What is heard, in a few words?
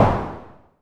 Drum X Clap; Pearl Drum-X